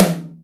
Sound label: Rock, Acoustic Tom; Yamaha DTXpress